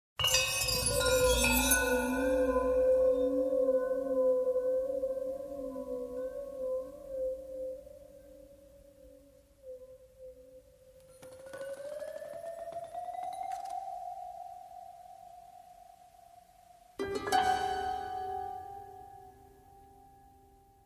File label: KENDO SWORD RITUAL